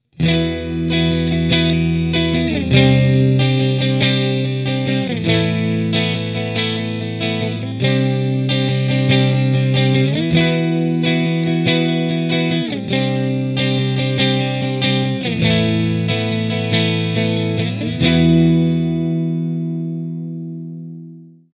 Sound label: Maxon SM9Pro+ bypass